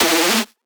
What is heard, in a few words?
synth screech oneshot E dry; Synth Hits; GhostHack